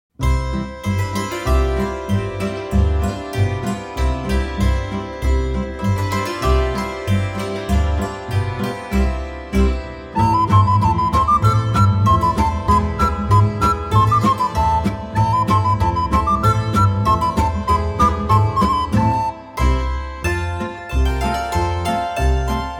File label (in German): Kreuzpolka